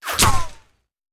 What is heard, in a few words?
Bullet Impact; Sound Ideas; Guns